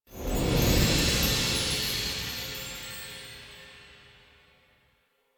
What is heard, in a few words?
Flying Music Start; Warframe; Bard Quest; Mission Two